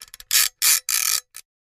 Caulking gun being loaded and unloaded. Tools, Hand Clicking; Woodworking Carpentry, Pumping